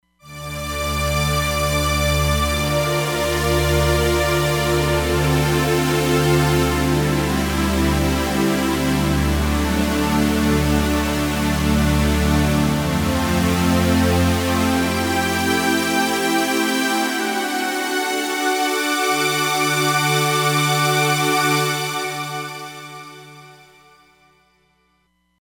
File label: Machine Str, Roland Fantom XR